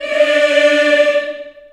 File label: D4, Choir